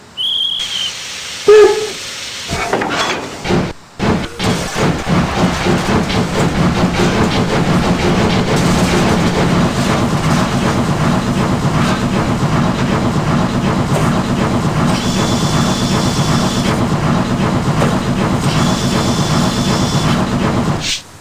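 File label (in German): Hörprobe BR 011; DB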